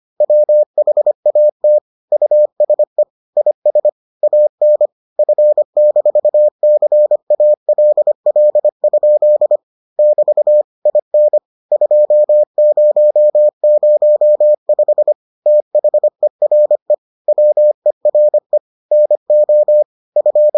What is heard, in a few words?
morse